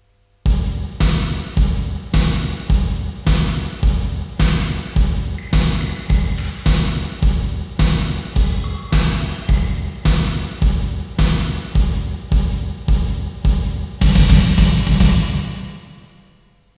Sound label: Hall Drums on